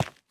凝灰岩踩踏声

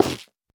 菌柄 破坏声

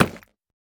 下界砖破坏声

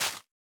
大型垂滴叶破坏声

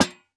灯笼破坏声

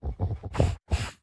嗅探兽搜寻声

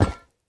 宝库踩踏声

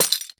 锁链破坏声

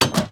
铁门关闭声

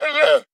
羊驼待机声

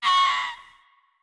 狐狸尖啸声

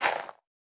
堆肥桶填充声

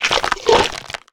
骆驼进食声

